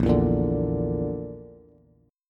Bb Major Flat 5th Bb down Bass, chord; strummed; JGuitar

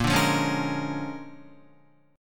Bb 7th Sharp 9th Bb, Guitar; Bb7#9; strummed; JGuitar

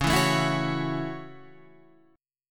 F sharp Minor 7th, guitar

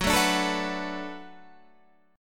D 6th Fsharp, chord

